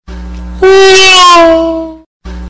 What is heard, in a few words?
neeeooooow